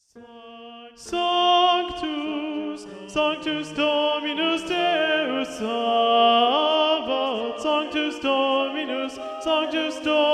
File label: Tenor Predominant; Haydn; Sanctus (Little Organ Mass); Choral Society of Greensboro